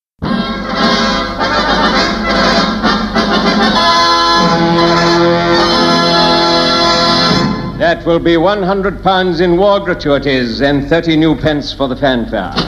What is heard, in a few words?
Jingles